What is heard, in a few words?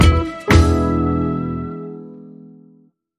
Bossa Bahia, Hit